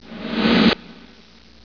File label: SNARE